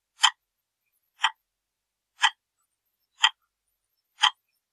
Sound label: clock Ticking